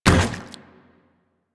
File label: Zombie Run Metal, Footsteps; Warframe; Infested